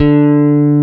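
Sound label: D2 TELECASTER, GUITAR; AKAI S-Series CD-ROM Sound Library VOL-8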